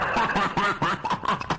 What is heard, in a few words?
the laugh; hlvrai